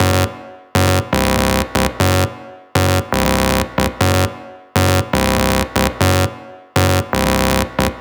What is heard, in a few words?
Techno Synth